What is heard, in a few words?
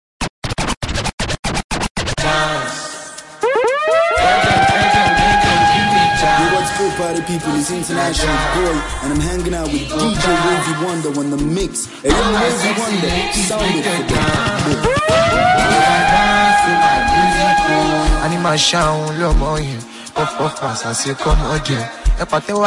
Amapiano Mix